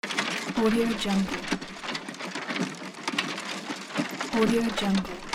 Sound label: wood wheel turn; moonduststudio